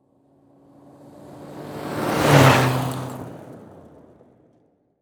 Auto Saturn Drive By Fast Dirt Road; Transportation

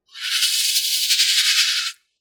squeeshy long, Transitions; CinemaSpice; Mograph Mini Transitions

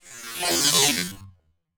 digisweep long; CinemaSpice; Mograph Mini Transitions

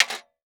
Impact Sheet Metal Hit By Wooden Stick

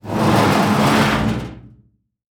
Foley Movement Metal Slide On Cement Floor Short

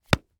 Sports Baseball Glove Catch Ball

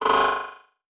Multimedia Internet CD-Rom Flash Click Multiple

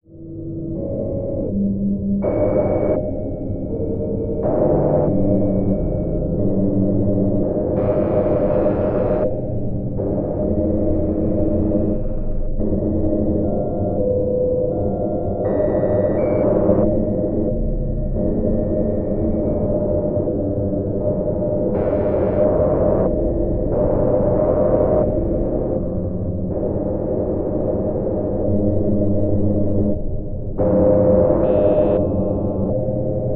Drone Computer Windy; Production Element Imaging; AdobeCC

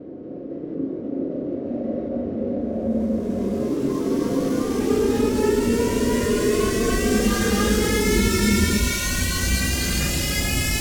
Pulsing Up Windy Ambient; Module Production Element Title Imaging; Imaging Elements

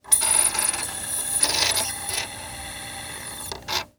Electronic CD Player Spin CD

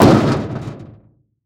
Foley Footstep Work Boots Metal Jump; Foley Footsteps

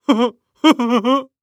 Cartoon Male Dumb Short Burst; AdobeCC

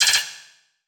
Title Transition Chopper; Adobe; Production Element

